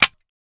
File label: Mouse Trap Quick